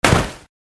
Impact; crashmetal cyberpunk 2020 gamerip pc

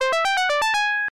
Boot; SAR Search and Rescue; arcade

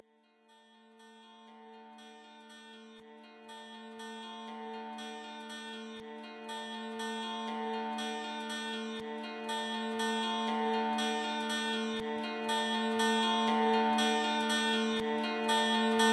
Mid Section Music; ether one redux gamerip 2015

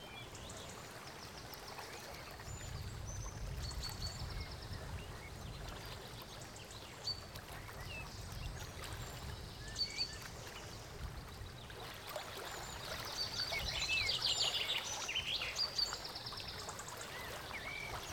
SCOT INN ONBRIDGE EVE; The 39 Steps

